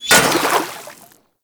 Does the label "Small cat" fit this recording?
No